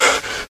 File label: Prisoner Running